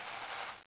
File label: foot spin; taunt; Garry's Mod; tf2